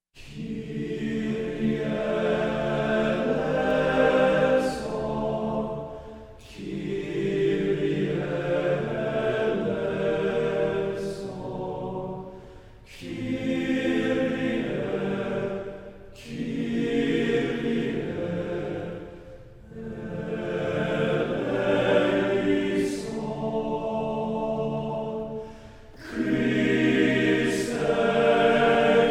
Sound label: missa brevis kyrie; aguiar